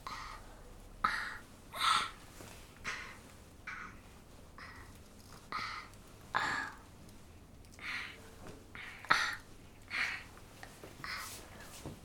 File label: Ahh